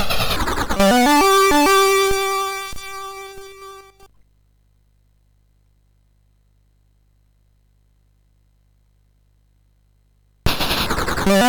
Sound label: sid MOS6581R2, GAMES; FireTrap